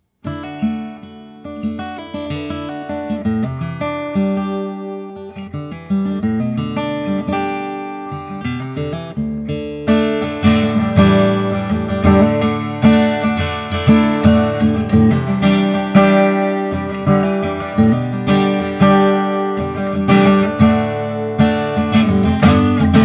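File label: Guitar Sample 20d, Vintage Prewar Guitars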